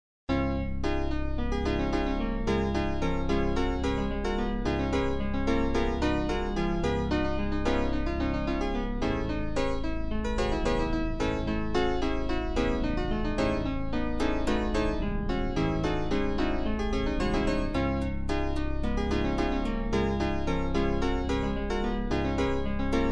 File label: Piano